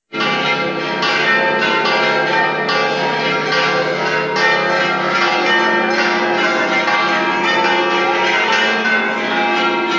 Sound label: Bells of Sacromonte; study abroad